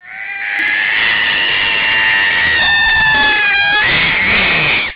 1 syllable yowl; sylvester the cat

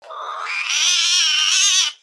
4 syllable escalating bothered; sylvester the cat

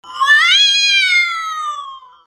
4 syllable peaky shriek 1758Hz; sylvester the cat

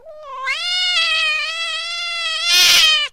3 syllable angry shriek ends with emphasis 3158Hz; sylvester the cat

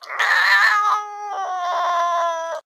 4 syllable ahahah urhh bothered 1765Hz; sylvester the cat

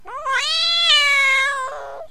3 syllable howl 2161Hz; sylvester the cat

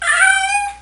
3 syllable sad whine; sylvester the cat

